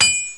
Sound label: anvil; Total Chaos Nukem 3d Pack; duke